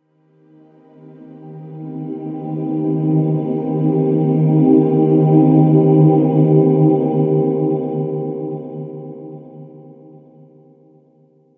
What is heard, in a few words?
AMBIENT TONE HIGH